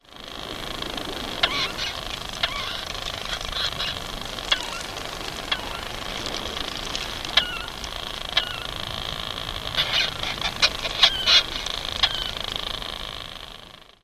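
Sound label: Least Storm-Petrel, static calls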